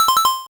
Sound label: Pause SFX, Sound effect of "Pause"; NSMB